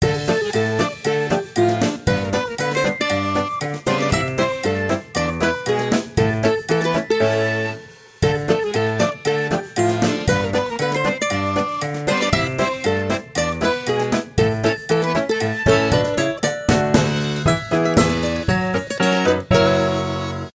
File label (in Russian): originalnye ringtony i uvedomleniia samsung galaxy s22 s22+